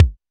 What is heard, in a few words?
kick, drums; Ableton Foundation FreePacks